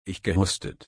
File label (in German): husten